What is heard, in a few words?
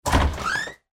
door open